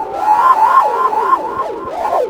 SPACE FX; Big Beat Samples & More; AKAI; OTHERFX KIT

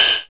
GATEK PERC; TEK PERC1; Drums In Your FACE!

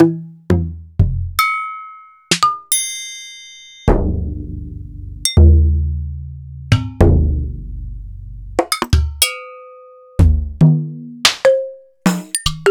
EMU Planet Earth

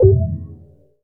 POPOVER, PERCUSSN; Northstar; The Wizard VOL-1; POTION

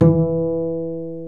PIZZ BASS E2, Pizz Double Bass

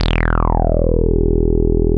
E2, Bass; CRANDLE; E-MU Producer Series Vol. 6 – Analogia Project (CD 1); Boom da Bass 1

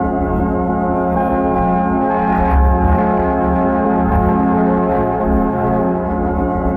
texture shatter looped; Polyend; Recue

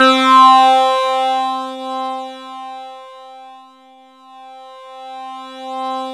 SINN LEAD, MORPHEUS; Syntec; Wall of Sounds

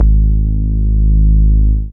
BAS MINI, Mini-Moog

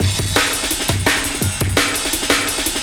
Jungle Rocker, Breakbeats

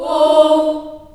D#3 child oh, Choir; CHILD OHS

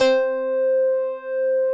OBDX GTR; Synclavier Sampler Library; KEYS AND GUITARS

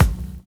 WHAA; Univers Sons - Kitch 70s

